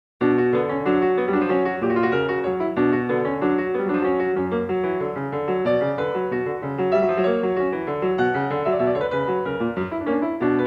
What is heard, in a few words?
Prelude No. 24 in B major; BWV893; Bach; Glenn Gould; The Well-Tempered Clavier; WTC Vol II